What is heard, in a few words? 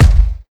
steel kick drum sample g key